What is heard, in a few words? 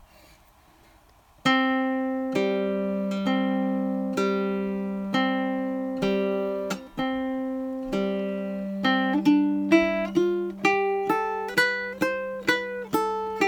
quick c scale